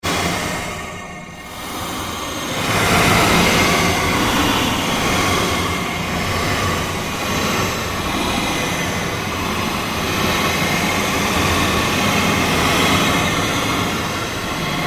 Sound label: Created Ambience Fluctuation, Rise And Dive Sound Effects; Sound effects created by manipulating other sounds; Rises and Dives